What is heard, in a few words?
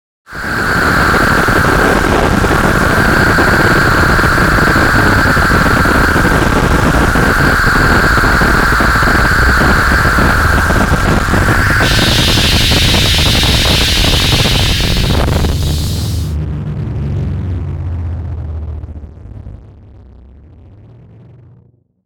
Bed Harsh Static Fuzz; Beds; Blastwave